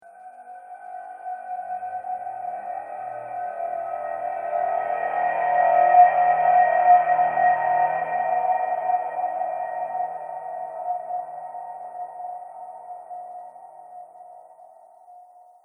Psycho Suspense Transition; Transition Sound Effects

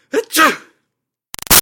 Human Male Sneeze; Sneezing; Sound Effects:Human; Big Room Sound

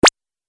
8 Bit Style Blip; Video Game Sound Effects

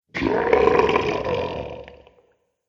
Dragon Thirsty Growl, monster; horror

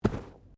Bodymovement Fall Light

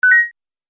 Multimedia Event Notification, beep sound effect

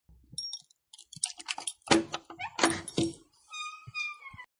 Rattle Keys, Unlock Door, Open Door; Miscellaneous Door Sound Effects; Lynne Music

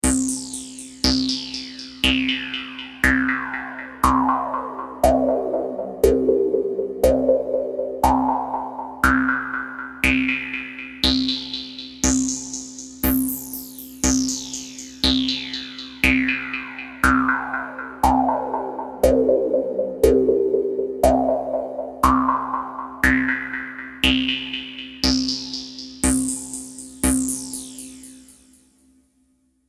Cosmic ticking of futuristic clock; Bombs (Sound Effects:Explosions)

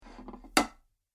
Large Dinner Plate Short Slide And Wobble; Dishware Sound Effects; Household Sound Effect

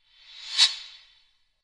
Fast Bright Metallic Transition; Scary Soundscape; Horror; Crafted Signals

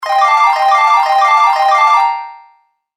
Arcade Slot Machine Jackpot Winner; Andrew Fleming

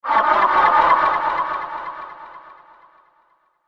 Alien Lizard Flyby; Alien Sound Effects; science fiction sound effect; Andrew Fleming; Twistedtracks

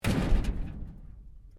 LOCKER METAL OLD DOOR SLAM; Old metal lockers

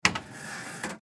Jukebox Cd Turn Page; Noise; Electronics; Blastwave

